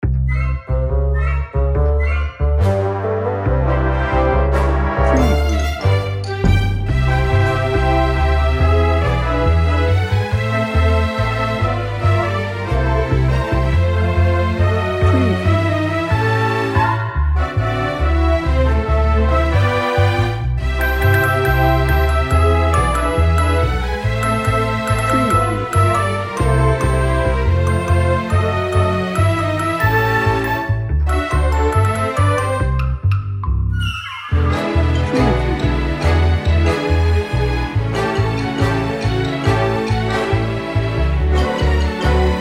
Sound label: broadway no trumpets no drums